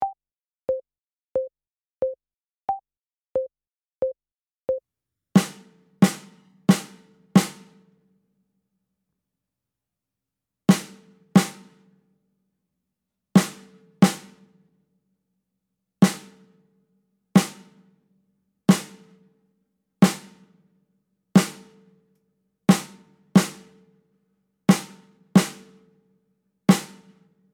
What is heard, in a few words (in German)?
Duett Snare ohne Click